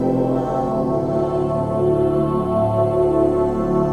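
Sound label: 122 BPM Pads A major G minor Tonic D; ambient synth pads; MMB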